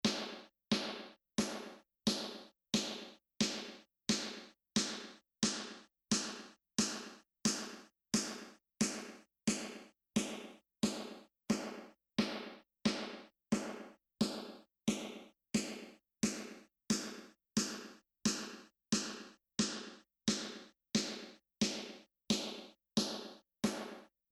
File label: Snare